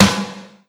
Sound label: Snare Rock